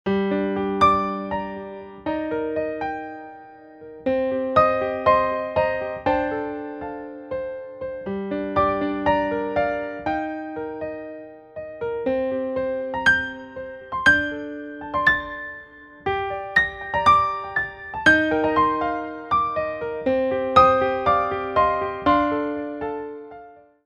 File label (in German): Acoustik Piano